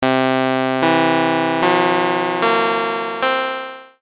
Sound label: brassy 5o4 11o8 7o4 2o1